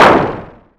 striking; attack

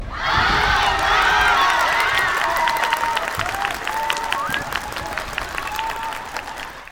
womens cheer

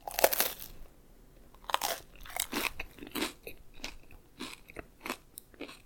rice cracker; eating

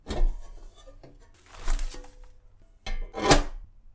mail box

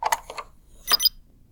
cardkey

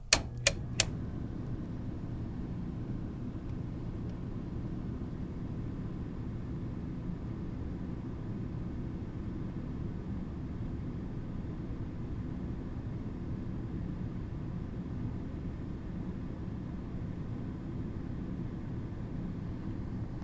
hotel heating

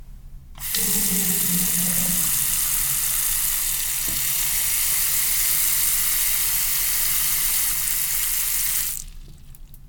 bathroom water

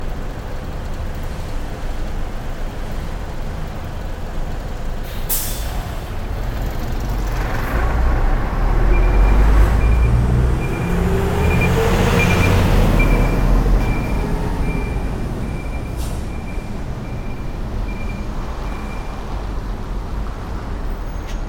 trucks

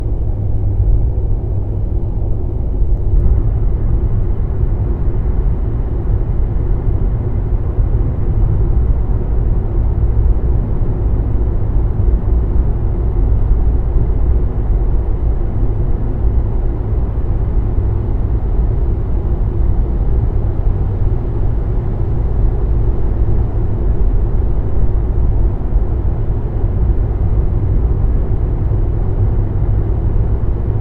freeway tunnel; car

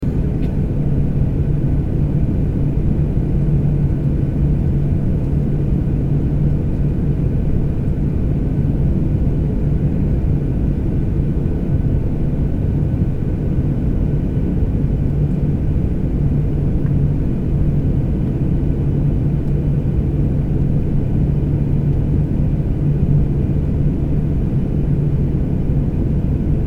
on airplane

